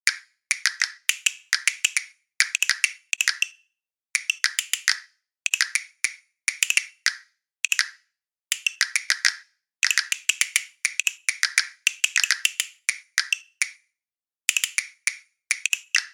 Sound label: Castanets